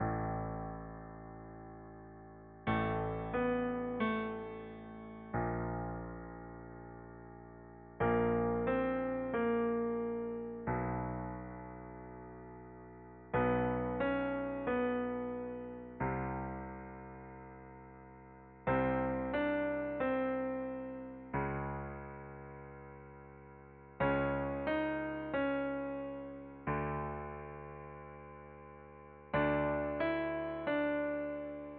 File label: Whole note A2 C4 Legato